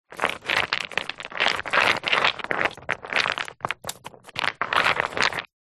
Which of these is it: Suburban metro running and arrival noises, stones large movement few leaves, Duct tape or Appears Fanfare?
stones large movement few leaves